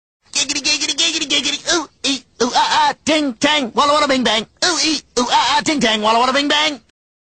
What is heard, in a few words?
Mobile Ringtone